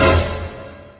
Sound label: shocking